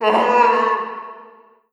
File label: fall yell, zombie; creatures; hotel